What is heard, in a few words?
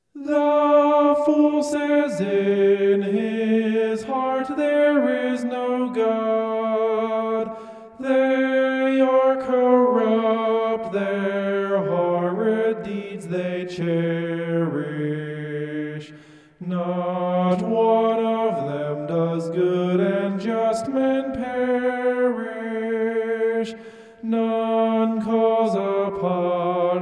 vocal homoph; psalm 14